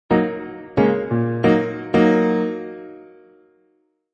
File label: Jazz piano riff loop in D# minor at 180 bpm.; Keyboard Loops; Jazz Piano Riff End